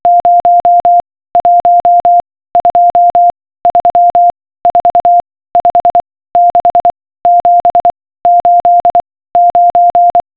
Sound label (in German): zahlen im morsealphabet